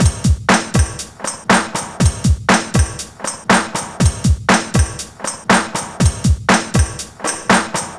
New Door Beat, Electronica Composite Beats; Sound Effect Library

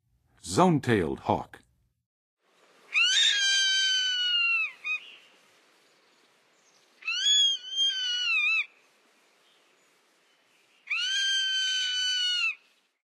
Zone-tailed Hawk; North America; Western USA; Western USA Songbirds

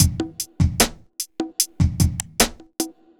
evil beats

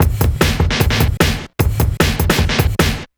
Drum, Breakbeat

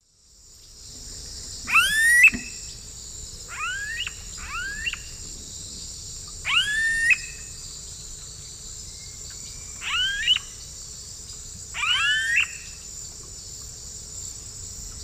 Crotophaga ani, pair calls; S. America; Eastern Lowlands

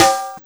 DRUM; DRUM SUGAR

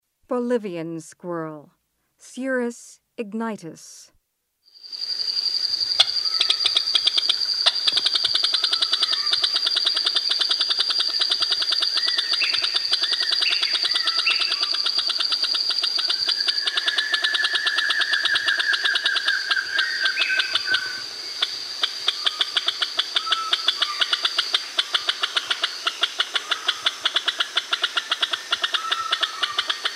Bolivian Squirrel; S. America

